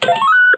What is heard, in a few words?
keypress, computer; federation